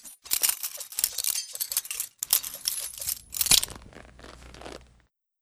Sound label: inspect; custom weapon; k31; octane